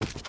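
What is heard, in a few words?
Small Man Run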